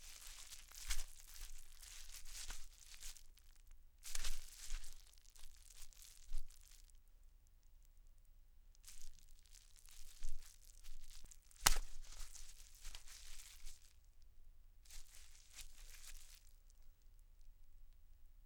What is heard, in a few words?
Wet Clothes; Fairlight Sound Lib